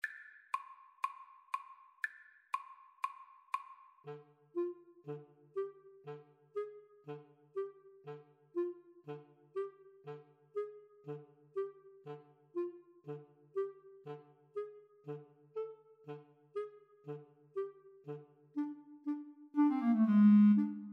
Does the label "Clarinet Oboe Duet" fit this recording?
Yes